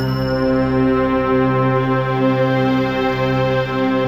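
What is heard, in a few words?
C 3, BELL STRINGS; Best Service; Advanced Media Trax Modern Composer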